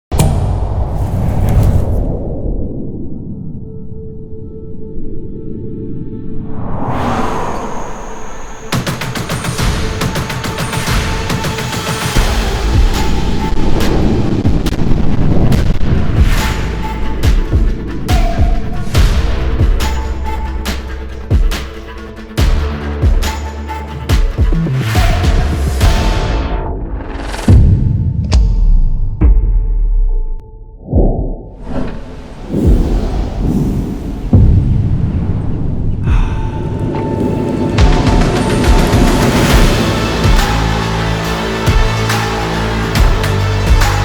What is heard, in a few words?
pulse sound effects